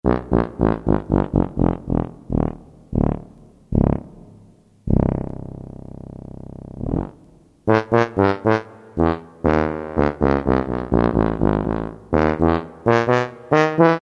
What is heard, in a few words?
TUBA; Orchestral Winds; Roland SN-U110-06